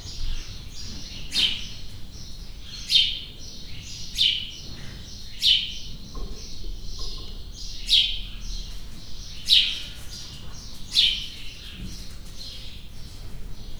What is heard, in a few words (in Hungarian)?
istálló, házi veréb; Hortobágyi Nemzeti Park 2013